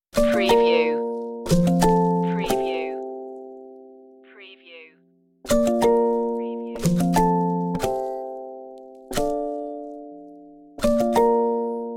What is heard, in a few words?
when life gives you lemons no perc